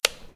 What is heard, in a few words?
beep